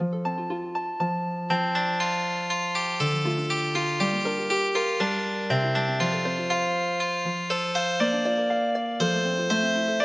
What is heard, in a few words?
Healing Harp; Loch Lomond